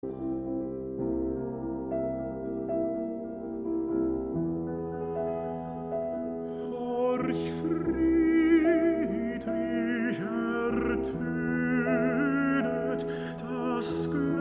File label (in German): Vokalmusik